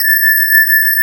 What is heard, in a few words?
METAL BAZZ